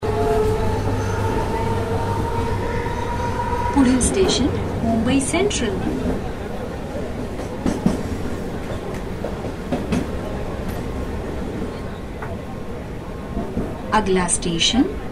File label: mumbai local